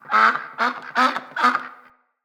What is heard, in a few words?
Trapped Duck Call; Encounters; Animal Rescue